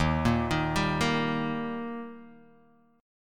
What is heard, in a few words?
D sharp Major D sharp Guitar DADGAD, chord